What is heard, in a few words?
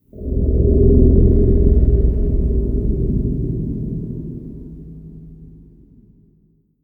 Forest Amb